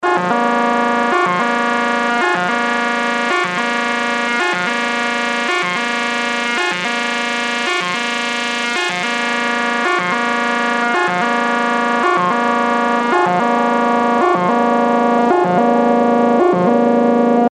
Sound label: loop 110